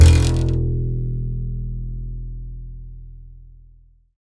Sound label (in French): c basse